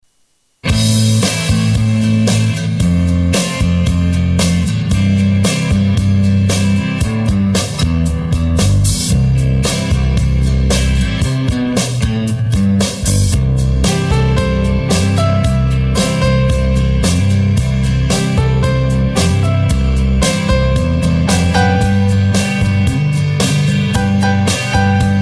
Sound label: Piano